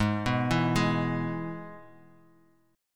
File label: G sharp Minor Sharp 5th; G#m#5 arpeggiated; JGuitar